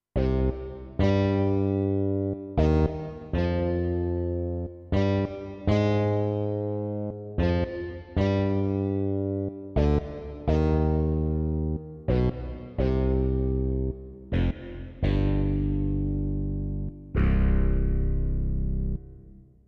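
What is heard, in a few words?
Bass & Distortion Electric Guitar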